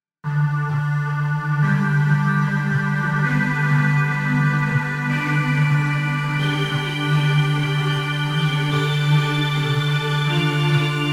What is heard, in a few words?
Pigments Own WT